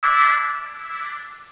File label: TIMEUP; Gub2dem